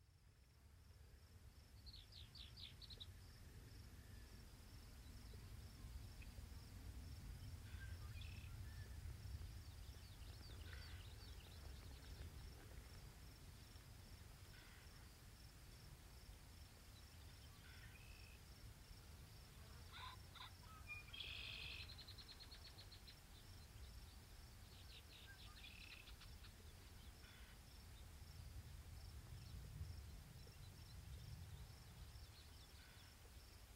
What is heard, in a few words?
prairie sounds